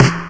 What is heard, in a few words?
Clean Snare C Key, snare one shot tuned to the C note. Loudest frequency: 1086Hz